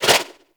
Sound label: Turbulence Deflect; Tengu; Warframe